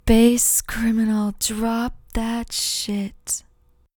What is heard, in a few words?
Bass